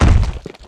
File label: walk; tiny